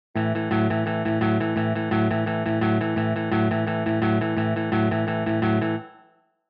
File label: guitar pattern 2 gt